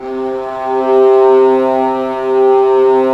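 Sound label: violas, sordino; Vlas Bow FX; Vas Sordino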